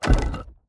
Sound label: environment building barrier place